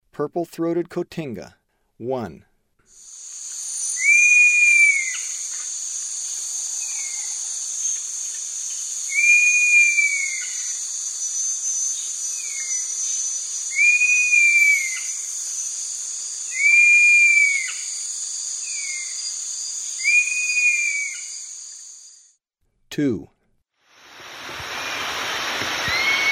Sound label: Purple-throated Cotinga; S. America; Eastern Lowlands